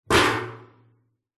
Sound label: metal container bin empty set down on concrete mic inside pov; zapsplat